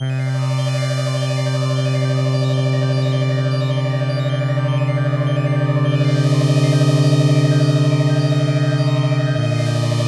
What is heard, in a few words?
Saw Loves Sine